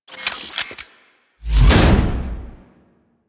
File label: Vent Finisher Arm Smack; Warframe; Venus; Orb Heist